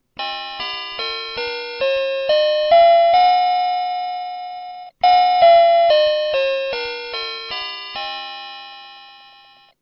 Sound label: Musical Scale; flea